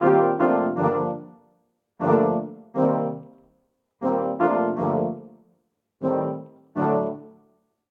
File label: Progression Private Eye VI-VII-i-iv-VI-VII-i-iidim-V G Minor 120 bpm, Brass Quartet; MIDI Clips; Ableton; Spitfire Audio